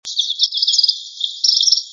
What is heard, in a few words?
Indigo Juv